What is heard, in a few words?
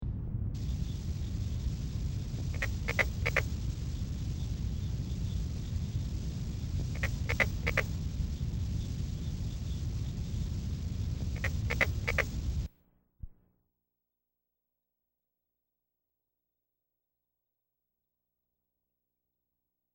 Carpenter Frog